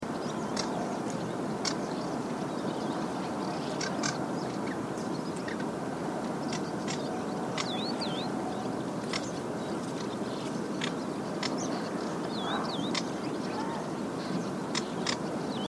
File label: Acrocephalus melanopogon call; passeriformes; acrocephalidae